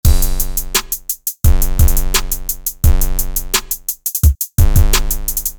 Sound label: Sample Beat